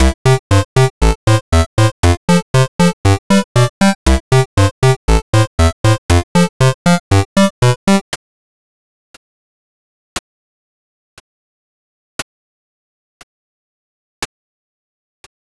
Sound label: jolly; mrpquest